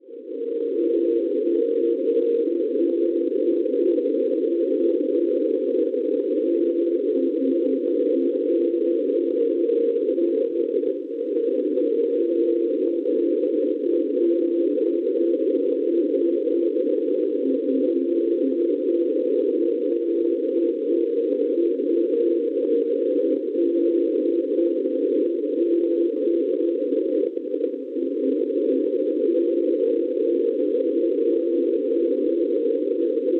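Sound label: non-directional beacon 413.5 kHz; DLS; Berlino; Schwarzsee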